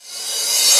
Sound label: Cymbals Reverse; Vengeance Samples